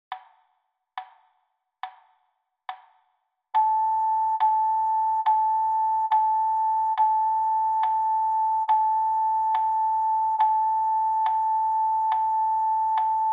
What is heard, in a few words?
Rhythm Practice A note